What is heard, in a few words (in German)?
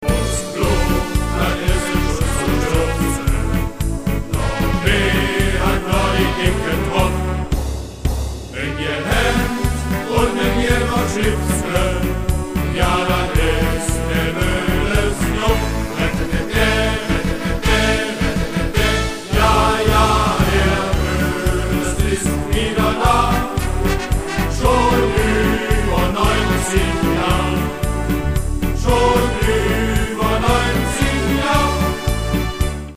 Klingelton